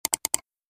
Double click computer mouse

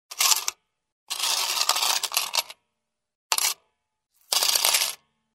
Dumping coins to the cashier

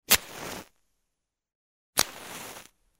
Lighting a match; fire

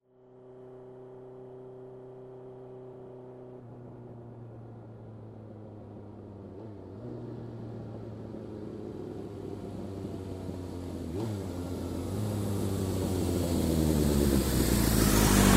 Motorcycle approaching, turning off

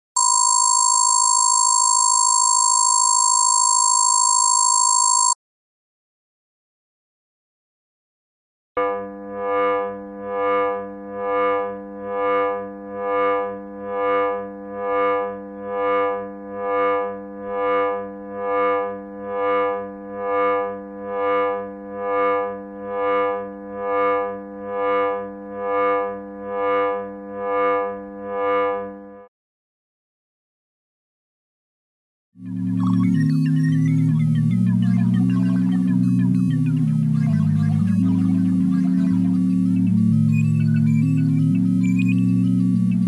Electronic signal; radio signals

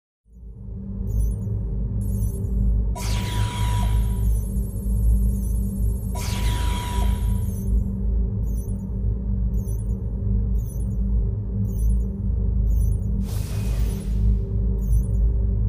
computer noise; radio signals

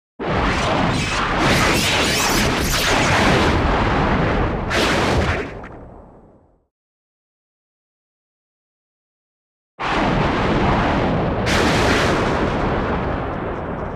Energy blast; explosions

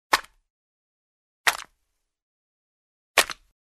crush a tin can; tableware

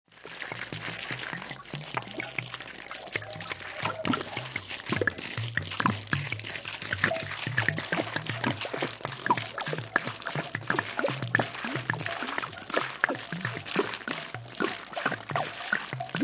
liquid from a bottle; water

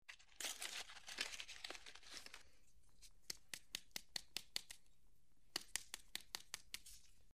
take a match out of the box, try to light it; fire

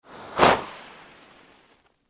Pyrotechnics; explosions

